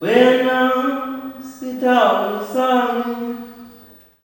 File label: "WEN-NA", MALE; Spectrasonics; Heart of Africa 2; S.MALEAMB